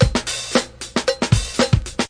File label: 113 bpm, cowbell funk, drum loops and breaks